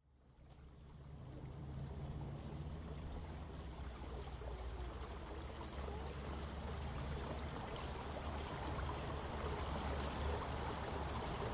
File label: stream mid day spring sunny; franklin park